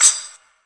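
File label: classic hip hop tambourine; STYLE KITS (Hip Hop, Etc); Classic Hip Hop Mega Kit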